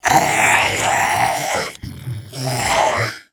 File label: zombie skeleton hit